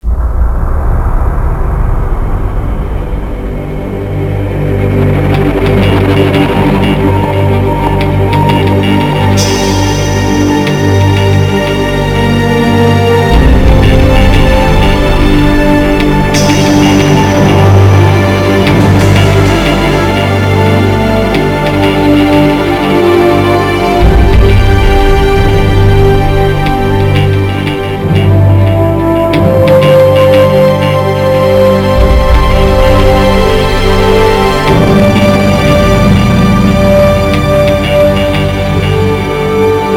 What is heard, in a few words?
victory; ambience